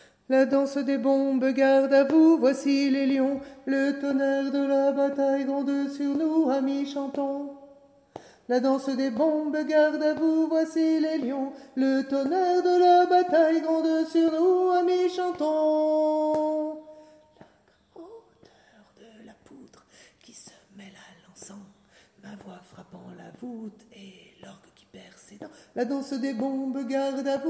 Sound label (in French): danse bombes alti couplet